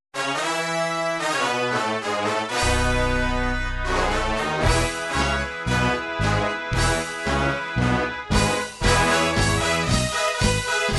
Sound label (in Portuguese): infantil marcha, midi